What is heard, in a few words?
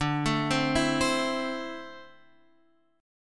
D 7th Suspended 2nd down Guitar Half Step Down